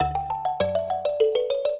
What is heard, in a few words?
trio, mbira; Mozart